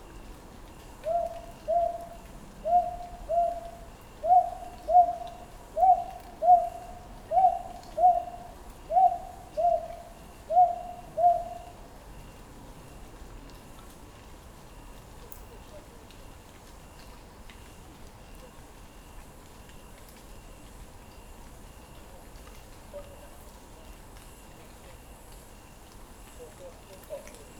japán fátyolosbagoly hangja

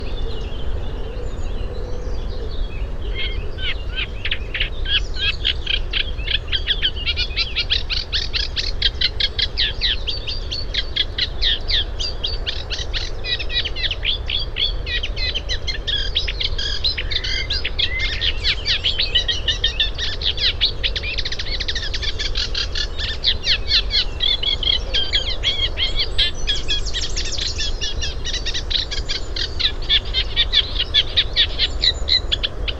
cserregő nádiposzáta hangja

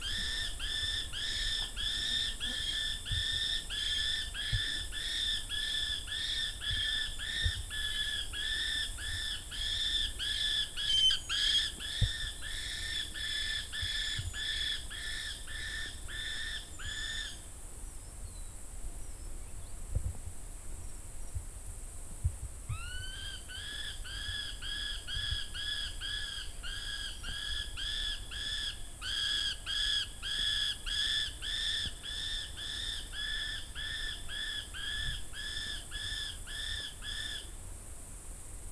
vándorsólyom hangja

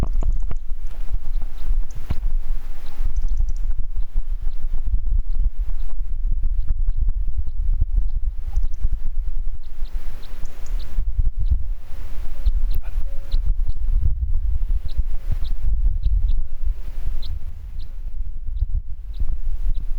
keresztcsoru hangja

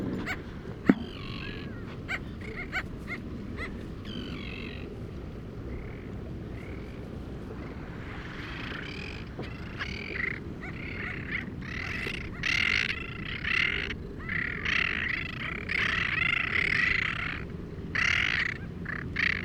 alarcos cser hangja

